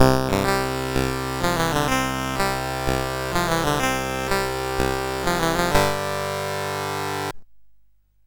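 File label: MOS 8580 SID chip music, games; CSG8580R5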